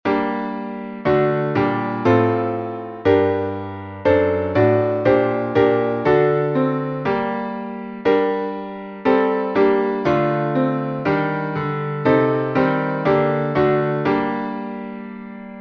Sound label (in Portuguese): salmo playback